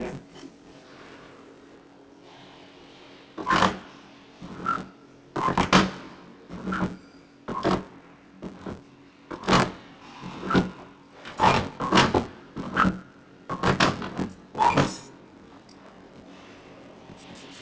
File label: ping pong